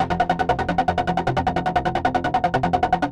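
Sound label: fast, fast sequence; Music Store; Yamaha VP-1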